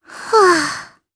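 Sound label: Vox Sigh, Japanese; voices; heroes; Rehartna; kingsraid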